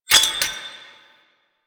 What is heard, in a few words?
Grenade Pickup, UI; Kahl; Warframe; Veilbreaker